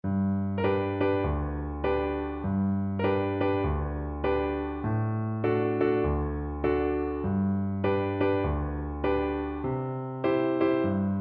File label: man of constant viola